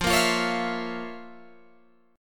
F Major 7th Suspended 4th Sharp 5th F, Guitar Open G